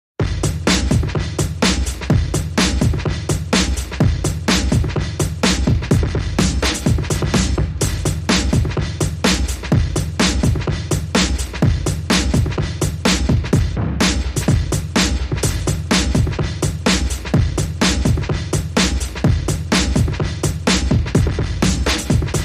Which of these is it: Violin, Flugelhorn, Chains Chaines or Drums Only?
Drums Only